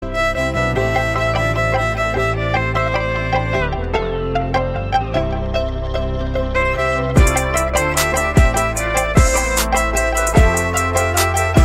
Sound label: Violin Remix